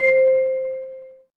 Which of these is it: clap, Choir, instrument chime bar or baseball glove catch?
instrument chime bar